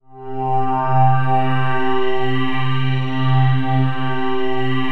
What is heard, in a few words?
Caverns, Noise; Cymatics; Shapeshift